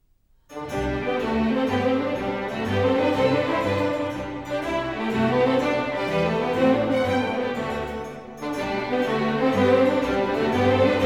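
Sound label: Bourrée; Water Music Suite No.3 in G major; Handel Masterworks; Klassika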